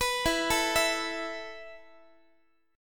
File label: E Suspended 4th E, down, Guitar; arpeggiated; Esus4; x,x,14,14,13,15; 4A,4E,5A,5D,5G#,6C#